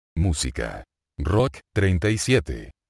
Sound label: rock